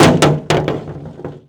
grenade metal

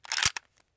akms slider down; Escape from Tarkov

